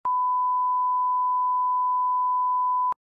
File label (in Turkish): sansur sesi